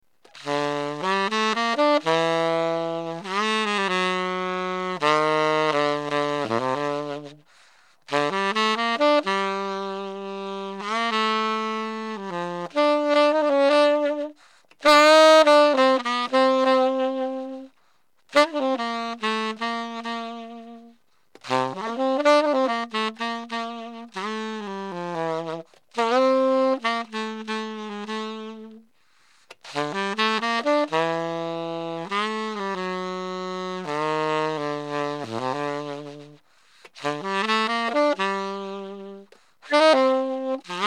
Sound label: shadow selmer sa80ts